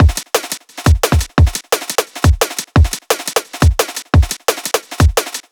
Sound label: Shuffle, Drum Loops, 174; M8; Electronisounds